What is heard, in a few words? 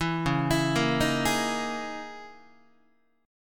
C sharp Minor 6th Add 9th C sharp, Guitar; C#m6add9; arpeggiated; JGuitar